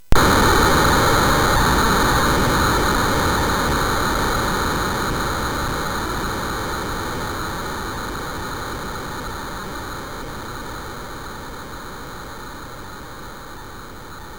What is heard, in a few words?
Frantic, MOS6581R4